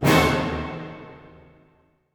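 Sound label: orchestra; Masterbits - Switch FX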